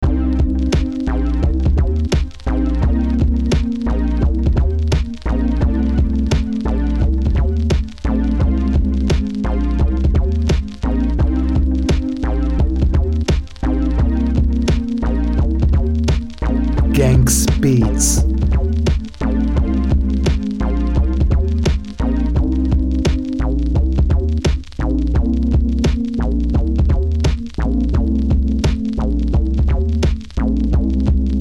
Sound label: boombap hiphop rap beat